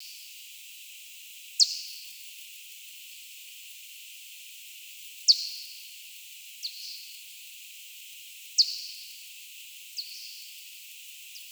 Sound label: P maderensis FM sigm 45 lent; Tenerife Las-Lajas; J-Barataud